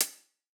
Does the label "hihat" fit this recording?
Yes